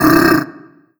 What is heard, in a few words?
pain, barnacle; Half Life Alyx